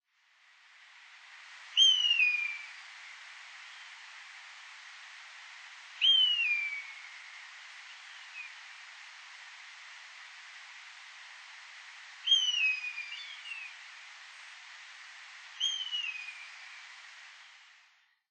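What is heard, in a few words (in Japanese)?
hachikuma, honey buzzard; nikko; koe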